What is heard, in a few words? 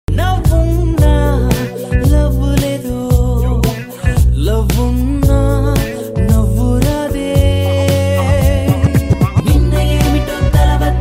telugu love songs